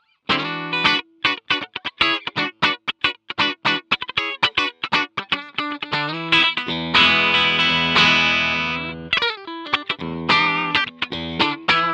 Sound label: Duckbucker clean; SDBR-1